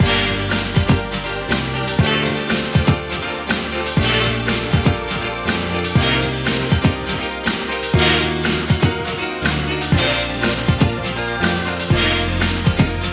theme piano loop; Blender Magazine volume1.3 1994; masthead

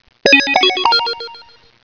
Crystal; ReminderPro 3.5

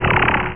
Spell Select; Realmz 2.2

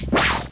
Transfer New Window; Anarchie Pro 3.0

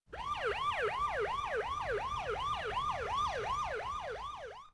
SIREN3; urbanchaos

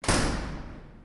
Exit (door)

